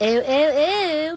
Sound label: oo oo oo; gwimbly